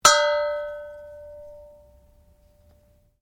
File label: GONG 4 INCH P; Instruments, Musical, Percussion; Foundation3